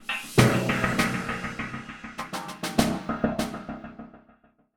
Stinger

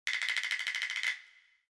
Buddy Warning

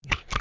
piglette oink

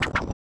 Whip

